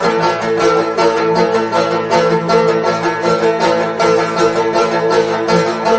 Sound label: banjo; music